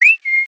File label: Whistle; Limitless